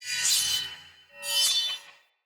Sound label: Curved Sword Finisher Stealth Accent; Weapons; Player; Swords; Sinecure